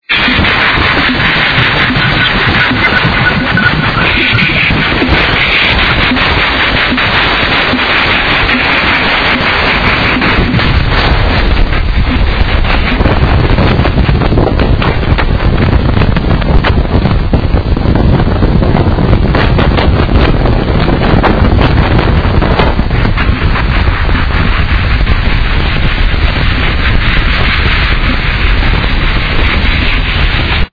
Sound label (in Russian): Электромагнитная активность около Ио